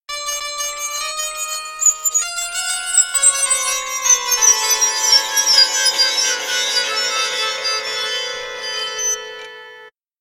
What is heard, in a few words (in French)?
harpe